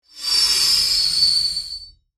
Metal Scrape, Production Element, Imaging Element, Accent, Transition; Warped